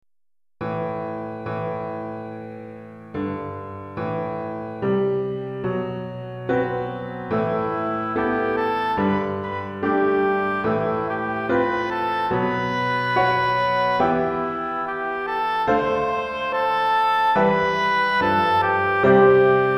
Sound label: Oboe Piano